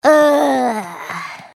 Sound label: groan; pony; Twilight Sparkle